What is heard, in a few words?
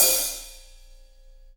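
HH, hi-hat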